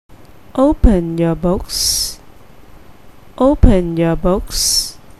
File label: Open book